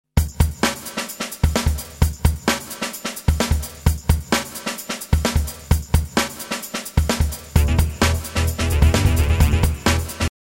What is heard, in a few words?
funky drive